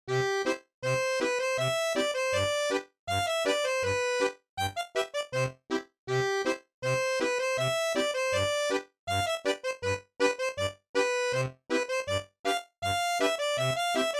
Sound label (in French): scottish accordeon